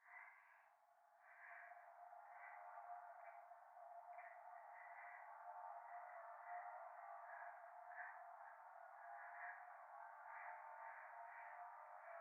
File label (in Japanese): 右耳 快感 吐息 車の中; 夢の中